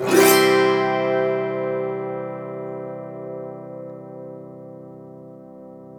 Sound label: AUTO HARP